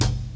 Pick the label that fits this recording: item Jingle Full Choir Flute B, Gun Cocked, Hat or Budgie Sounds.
Hat